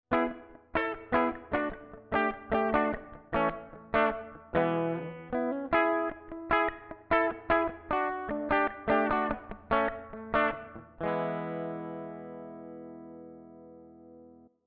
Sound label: Bassman Clean